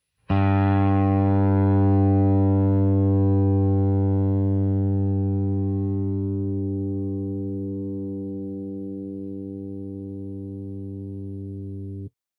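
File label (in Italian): Corda SOL intonata, basso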